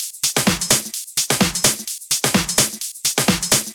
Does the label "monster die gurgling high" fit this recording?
No